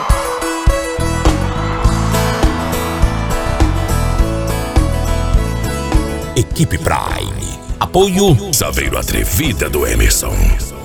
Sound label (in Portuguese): moda de viola; frequency mix